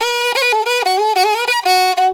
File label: riti grooves; Best Service; World Colours